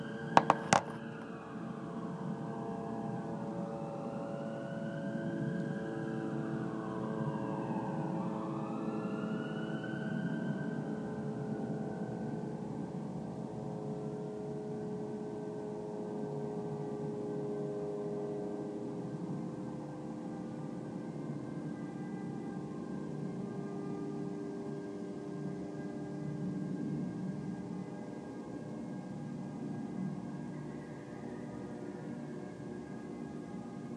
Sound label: ambulance sirens and firefighting jets whining, gaza